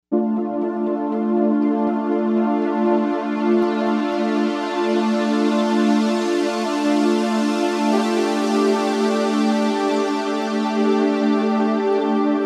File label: Evolution Pad, Novation UltraNova; Factory Patches